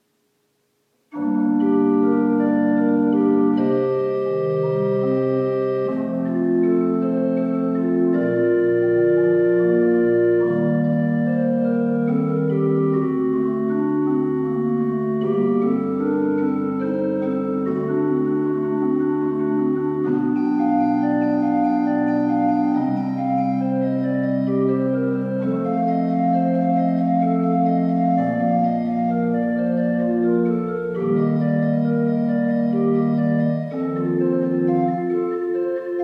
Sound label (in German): Praeludium in g